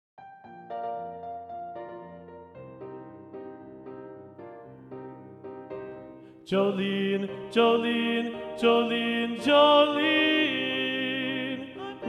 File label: Alto; Practice Room